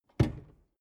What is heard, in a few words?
drawer medium close lock